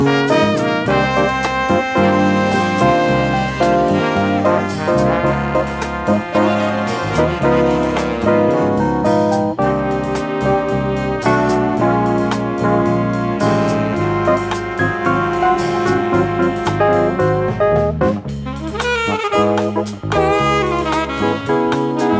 Brass Winds

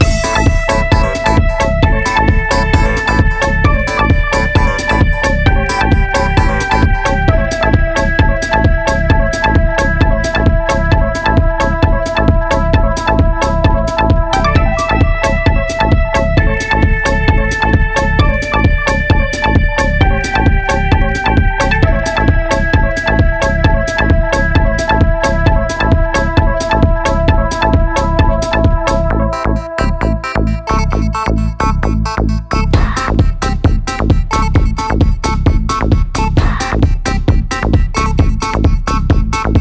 Loving Clav

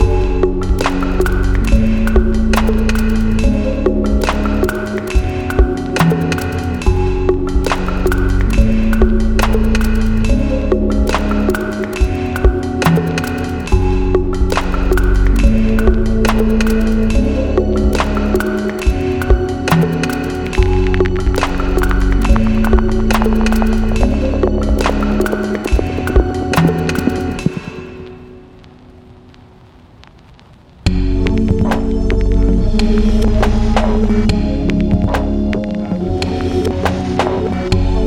Taps

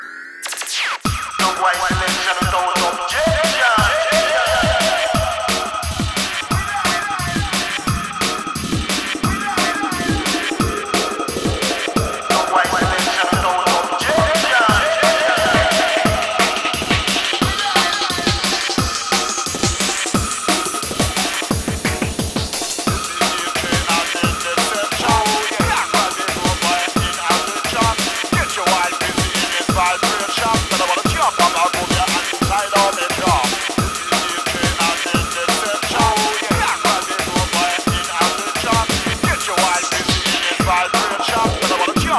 Dancehall Theme

